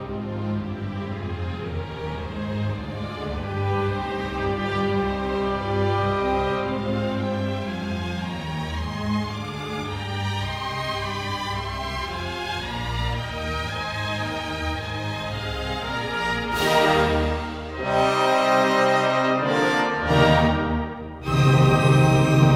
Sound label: anthem; Sewerland